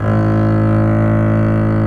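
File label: Bass legato A0; Digital Sound Factory Virtuoso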